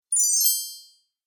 Magical Turning Spell

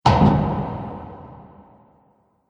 Haunted House Door Shut; Creepy Door

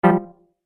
Tap Wrong, button; Multimedia Sound Effects

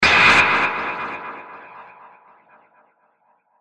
Hit To Whoosh Wah Reverb; Blastwave

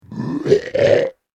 Monster Indigestion; Monster Sound Effects; fantasy; Andrew Fleming

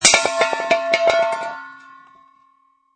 Metal Dropped Propane Tank; Metal object such as propane tank, cooking pot or bucket falls and clanks onto surface; Clang Sound Effects; Lynne Music

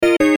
chime bleeps; Multimedia; Chimes; Blastwave

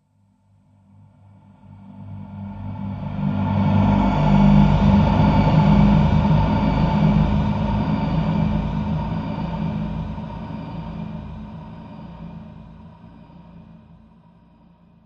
Ghost Hunters Spectral Presence; Ghost Sound Effects

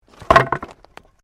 Wood Movement Medium

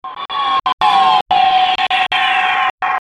Stutter Descend Swell, Stutter Radio Transmission

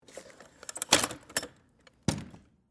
Wood Ladder Open

